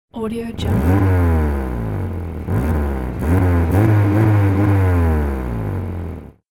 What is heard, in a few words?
rattling car revving